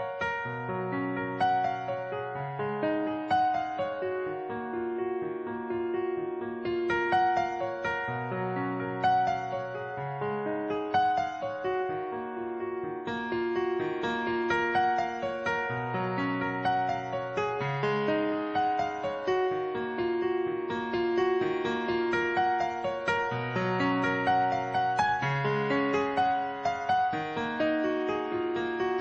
piano

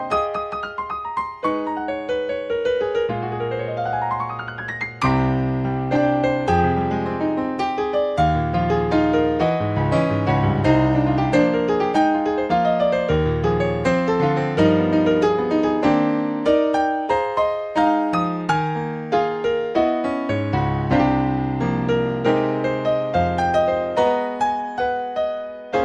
wonderful piano jazz

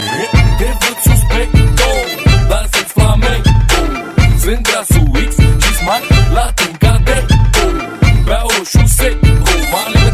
flamenco; Latin

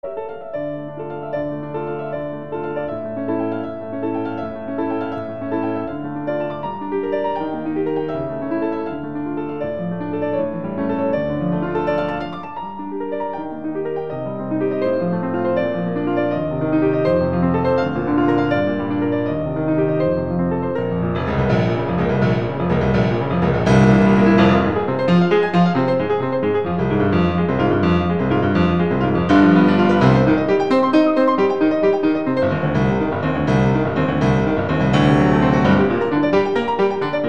Beethoven Piano Sonata No. 17; (The Tempest)

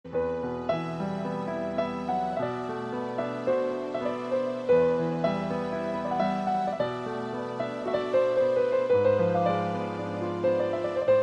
Azerbaijan Piano

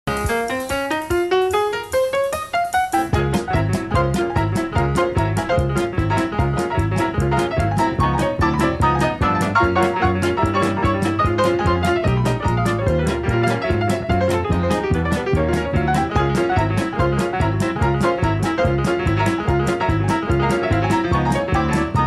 cabaret jazz

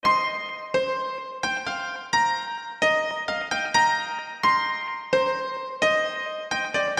the crying spell beautiful intro

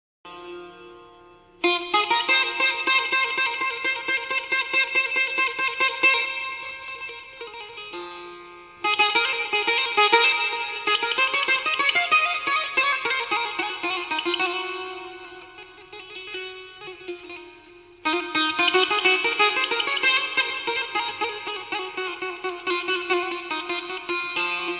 sweet guitar tone; Electronica

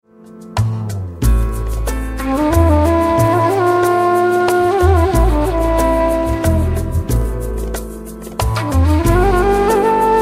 sad melody instrumental

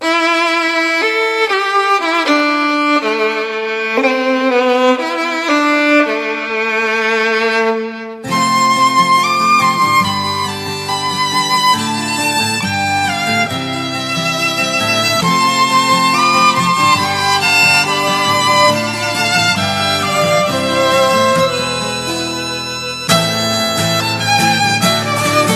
slovak violin; Electronica

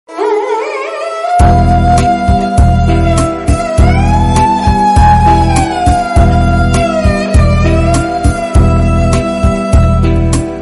sarangi, breakup

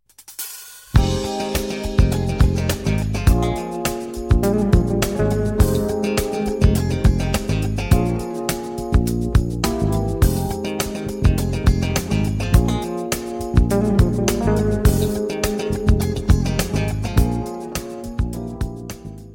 holiday tone; Jazz

